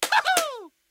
clap; irish pot luck

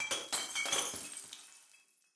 beat break glass; goldentavern

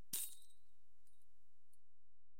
multiplier win total; serengeti kings

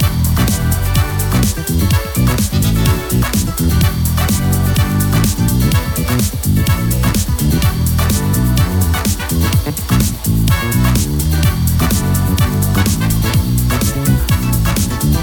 music; vegas night life

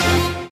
super Meter Win Line; mega joker

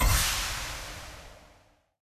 start game; joker pro

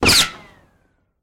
ricochet; dead or alive 2

